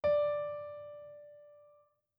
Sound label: piano d2